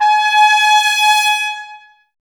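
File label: BRS trumpets G#; Miroslav Vitous; Woodwind Brass Ensemble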